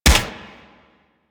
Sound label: Swarm Rifle Shot; Warframe; Infested; Shock Swarm Rifle